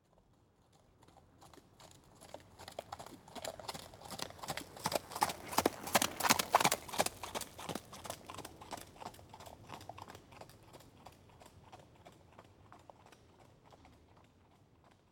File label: Animal Horse Canters By; animals; AdobeCC